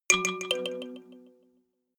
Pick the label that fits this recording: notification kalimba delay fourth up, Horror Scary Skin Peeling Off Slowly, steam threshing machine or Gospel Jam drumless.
notification kalimba delay fourth up